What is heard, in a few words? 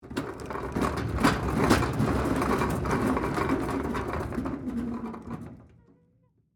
Tool Chest Spin, Industry, Metal, Tool, Chest, Spin, Roll; Hand Tool Sound Effects; BlastWave